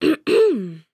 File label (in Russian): zvuki pershenie v gorle kkhm